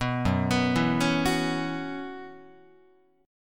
F sharp 7th Suspended 4th, Guitar; arpeggiated; F#7sus4; 2,2,2,2,5,5; 4A,4E,5A,5D,5G#,6C#

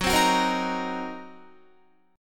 Gb 6th Flat 5th Gb down Guitar

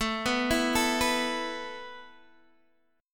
A Suspended 2nd A Guitar DADGAD, chord

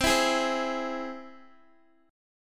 C Augmented down Guitar; strummed

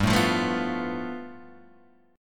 A 7th Suspended 2nd Sharp 5th

